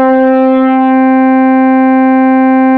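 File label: Ensemble C4, Fairlight CMI IIe Keyboards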